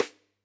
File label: K1 close SdSt, drum